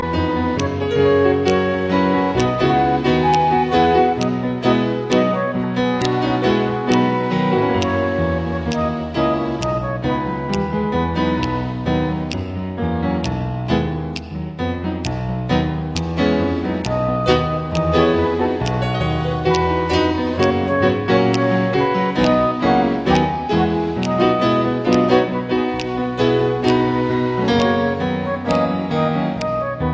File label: Instrumental Go Tell It